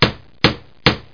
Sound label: HAMMER; TOYOTA